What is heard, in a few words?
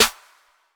crisp acoustic snare a key